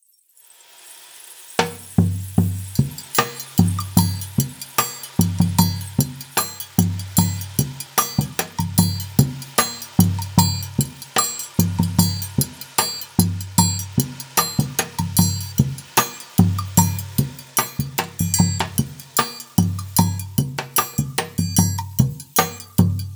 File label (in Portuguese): xote percusso; brazilian series; muletone audio